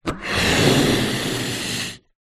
foley balloon blow up inflate

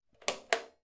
household light switch pull cord on or off

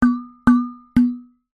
household thermos flask impact empty

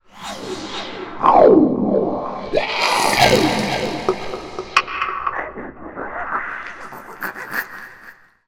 sound design freak show horror sweeps hits

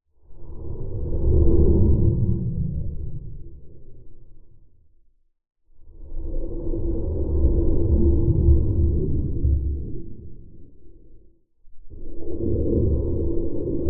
underwater move swim; lukas tvrdon

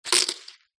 poker chips stack knock over

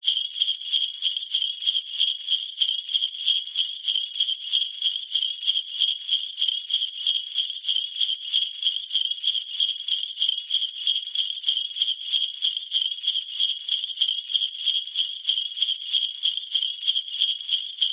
musical large sleigh bells med shake

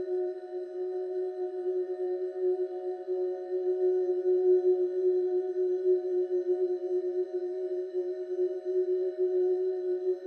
Drone pleasant muted major chord with high sheen

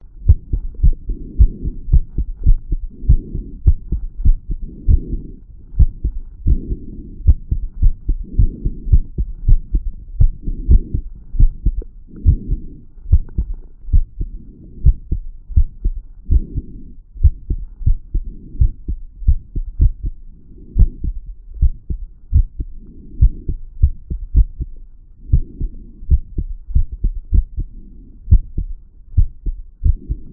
heartbeat skippy heartbeat at tricuspid

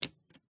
typewriter single strike; mkh8040; PMSFX

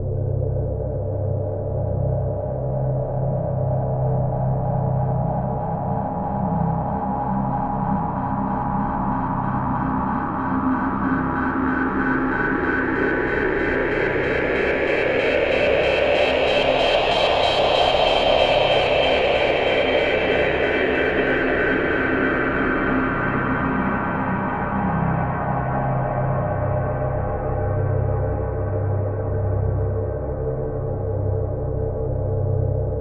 Atmosphere alien incubator; sumo blanco

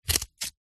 office scissors cutting cardboard single

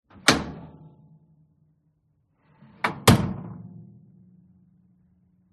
Oven Toaster Door Open Close; PMSFX

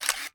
camera shutter release nikon f4; technology; sound effects; sound effects one

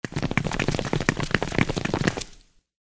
cartoon people stampede; fork media

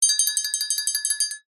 musical triangle hit rattle muted

